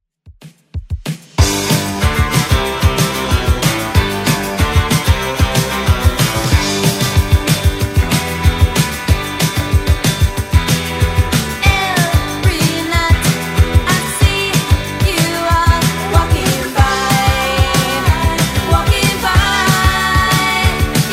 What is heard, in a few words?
80s Redrum, 187bpm